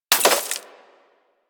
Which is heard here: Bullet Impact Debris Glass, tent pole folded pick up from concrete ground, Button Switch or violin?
Bullet Impact Debris Glass